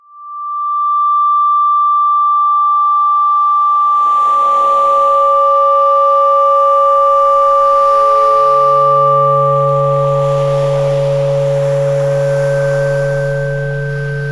Lower Chakras Cleansing Frequencies

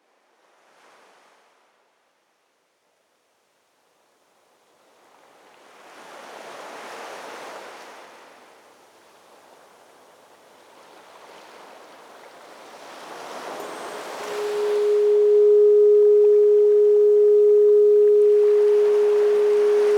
Soft Bowls Over The Ocean

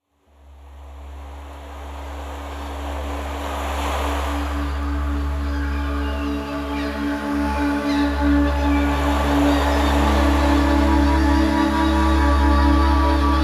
285 Hz

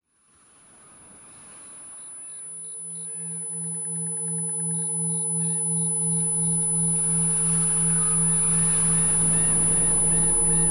Singing Bowls On A Tropical Island

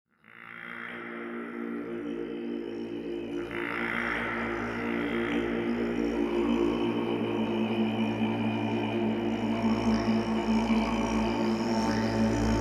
111 Hz

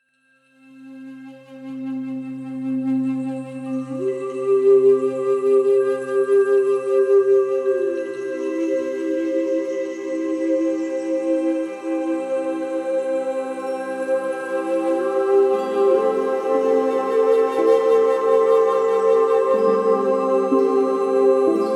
Love Frequency Meditation

